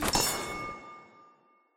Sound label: conveyor switch right; Poppy Playtime; Environment WaterTreatment